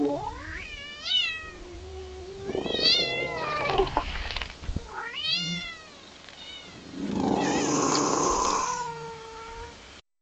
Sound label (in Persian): صدای گربه نر عاشق; صدای گربه برای جذب گربه